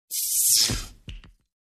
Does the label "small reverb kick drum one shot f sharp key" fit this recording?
No